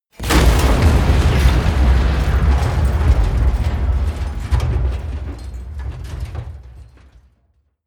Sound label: smash, baugewerbe